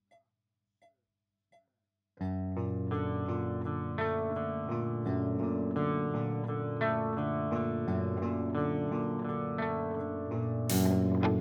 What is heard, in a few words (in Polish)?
riff proba solo